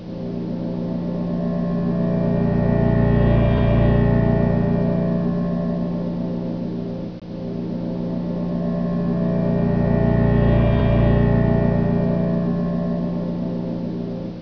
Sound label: scary loop